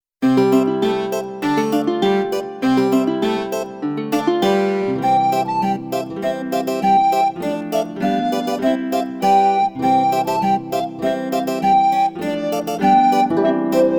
london town has fallen down backing

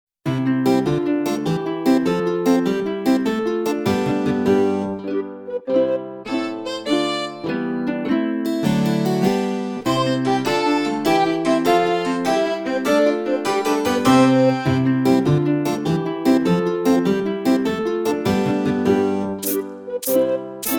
loaves of bread and pudding and pies backing

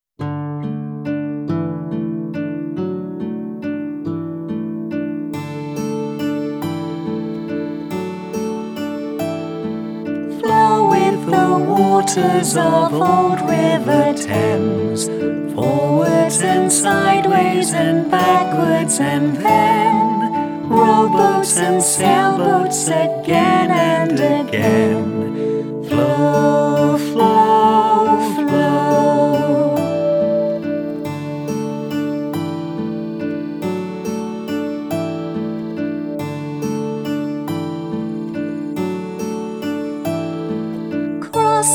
flow flow flow vocal

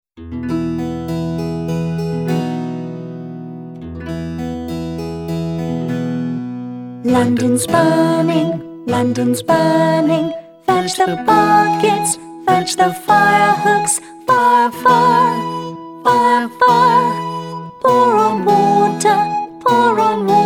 london's burning vocal

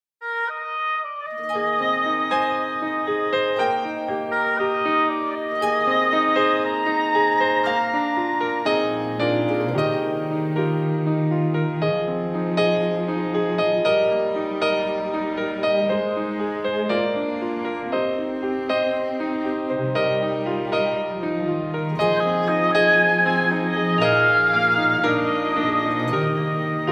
by the morning light instrumental; a midsummer nights dream

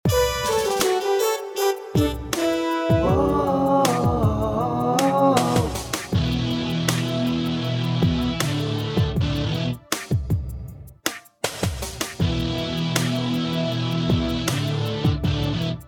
killing ground backing; macbeth

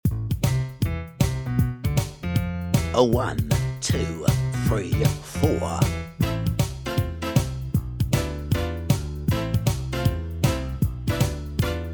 assassins backing; macbeth

